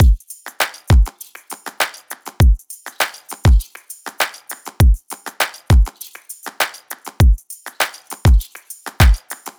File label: drum loop 100; Pop; Drums; Full Drum Loops; fern; Slate; SD PASADENA Pop Sample Pack